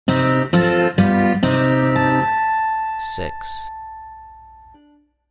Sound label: 6th Slide Lap Steel Guitar Minor Degree Name; ear training instrumental color